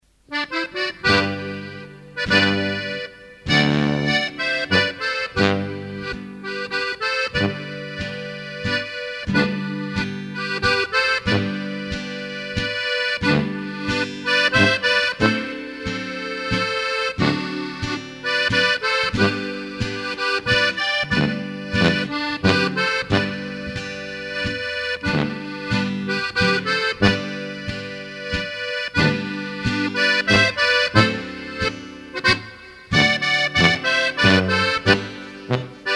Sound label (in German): Plattler; Ruhpoldinger II